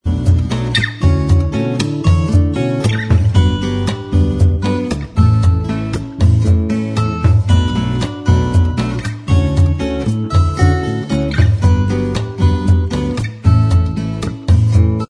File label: acoustic style Inst Track